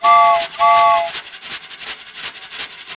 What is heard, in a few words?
toy; Microsoft Graphics Studio Greetings; Microsoft 1998